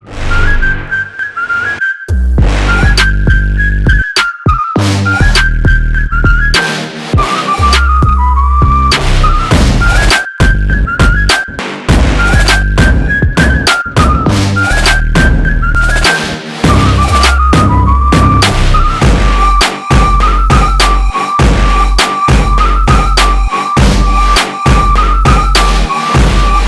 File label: sitar